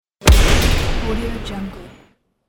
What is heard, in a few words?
dynamite fx